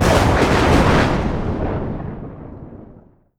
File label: Lightning; luigis mansion dark moon; 3ds switch